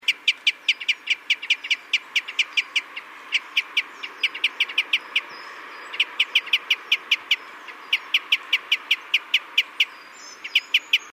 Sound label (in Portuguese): cruza-bico